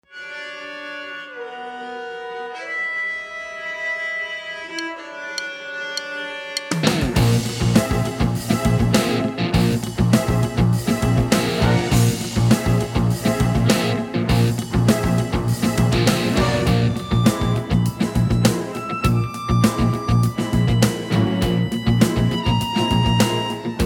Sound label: Rock Orchestra; Character Themes 27